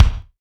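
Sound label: bass drum, real mega drums; Best Service; Real Mega Drums VOL-1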